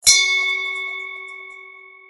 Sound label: bell ring